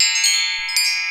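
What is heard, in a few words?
wind chime, Roland JD-990; Drums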